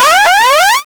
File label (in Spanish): jumpscare algo tipo graaa